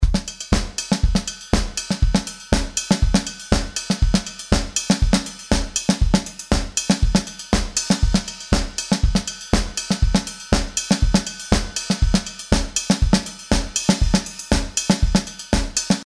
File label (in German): Die Modulation der genanten Parameter erzeugt beim Ride-Becken den Eindruck etwas ungleichmäßiger Anschläge; Steinberg Groove Agent 4